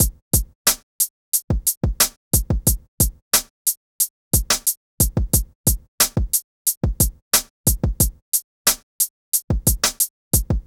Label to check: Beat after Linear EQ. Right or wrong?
Right